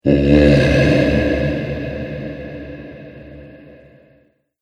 Ghost Egyptian Phantom; Ghost Sound Effects

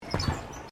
Footsteps Boots Suspension Bridge Wood Wire Step; Footstep Foley; Footsteps (Sound Effects:Human)

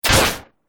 Laser Cannon Gun; Laser Gun Sound Effects

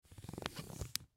Zip Lock Bag Close; Household; Plastic; Sound Effects:Materials

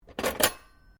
Cutlery general pick up, Household cutlery; Kitchen; SoundEffects Foundation2

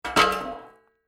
Metal Impact Drop Metal Pipe On Metal; Large metal pipe impact with metal; Metal Sound Effects; Lynne Music

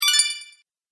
Bright Bonus Win, video game

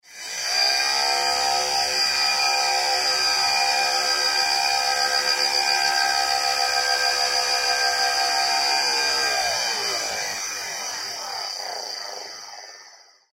Science Fiction Servo Motor; Spacecrafts

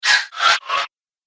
Back And Forth Whoosh; Whoosh Sound Effects; Andrew Fleming

